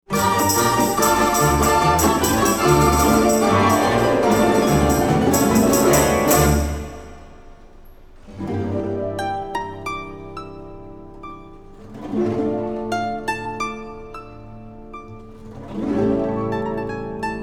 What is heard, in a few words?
Two Guitars